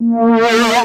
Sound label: Tonal FX A#